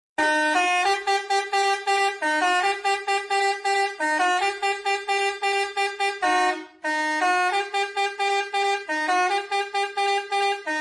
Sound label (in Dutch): Toeter; nieuw klein